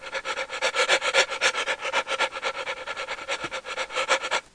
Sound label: psycho alert; cof